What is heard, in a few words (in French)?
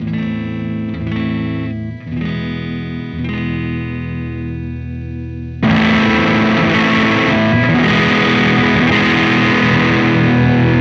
effet fuzz